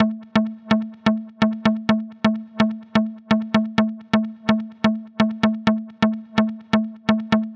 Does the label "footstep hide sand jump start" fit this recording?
No